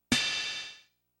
crash; superstar

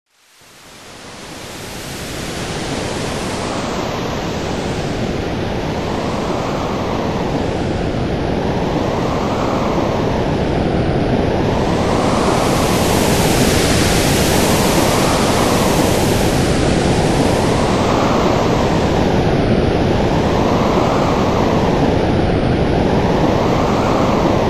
Wind; dreamland3

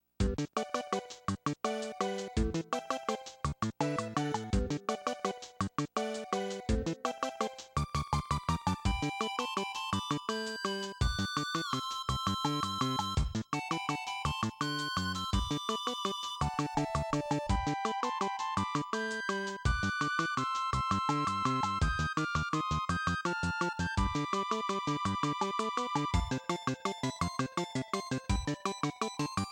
bubbly clouds; superstar

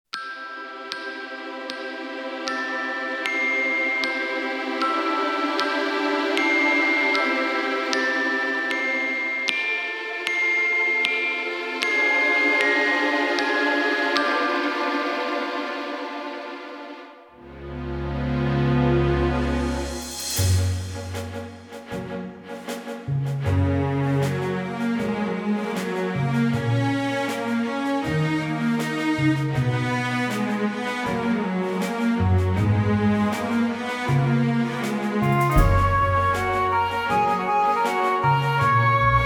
Acoustic Version; superstarultra

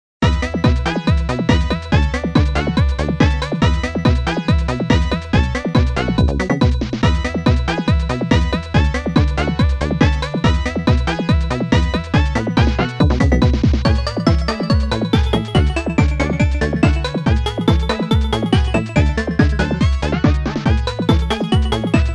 Forest Scene; avalanche

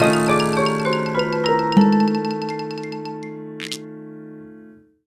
Failure; epicyarn

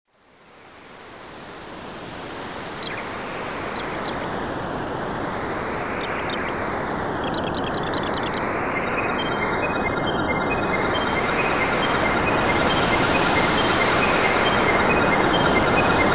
Wind; Kirra Kirra Kizzu

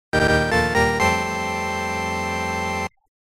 Stage Victory; avalanche

